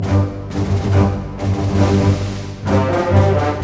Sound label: BOLE, ORC Bolero; East West - Scoring Tools